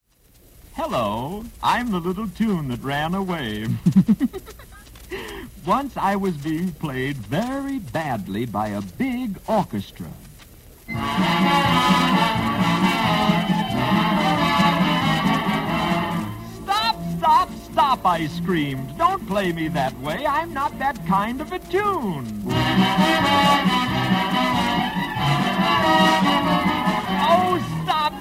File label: little tune